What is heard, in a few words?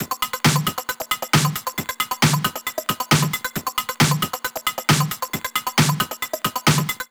135 DRUMLOOP; Loopmasters; Booty Breaks Vol.2